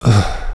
DYING; BattleSNDS

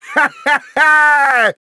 LAUGH; BattleSNDS

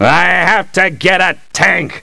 LAUGH; BattleSNDS

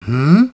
humm; BattleSNDS

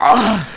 DYING; BattleSNDS

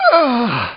DYING; BattleSNDS

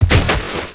COW FALLING

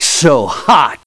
LAUGH; BattleSNDS

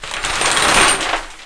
CURTAINS DOOR CLOSE

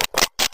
bolt-action SMLE

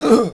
hit; BattleSNDS

